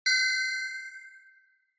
Inspiration High; Onoma

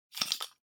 Hourglass, Noise; タイマー機器

